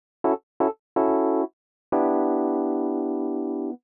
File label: 125 Rhodes E, Keys, Nu Jazz House; PowerFX - Nu Jazz House